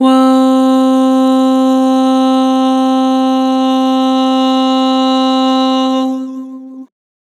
"Woah", Harmor Vocals

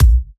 Bassdrum, dubstep and trap

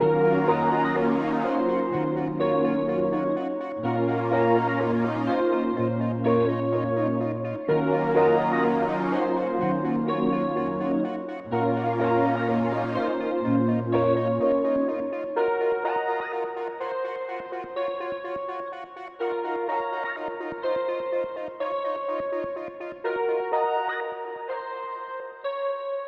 125 BPM A# Min, Melody; Power; Wavy

